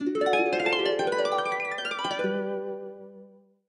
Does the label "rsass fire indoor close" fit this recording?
No